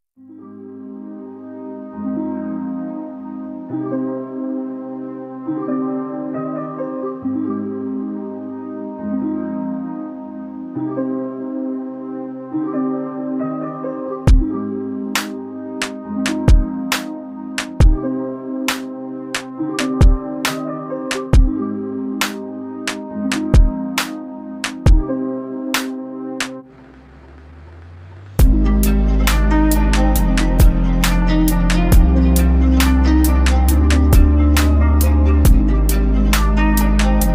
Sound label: remix instrumental